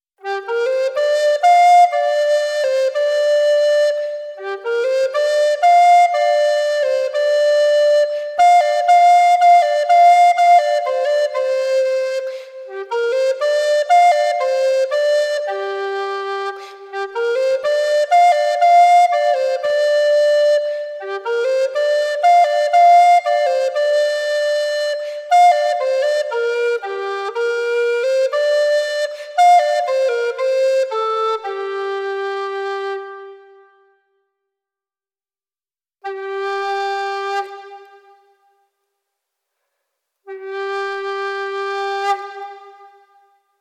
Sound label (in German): floetentraum